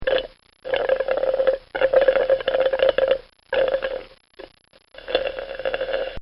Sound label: Cartoon Sound Effects Slurps; Cartoon Sound Design